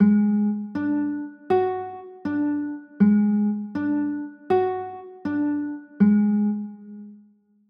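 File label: G sharp 7 sharp 9 chord, guitar; g sharp 7 sharp 11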